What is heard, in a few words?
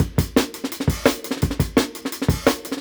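Jungle Breaks, 170 bpm; Harsh; Original Jungle Breaks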